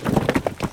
Rock Fall Large; Becher Tycoon

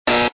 Wrong Target; mystic training

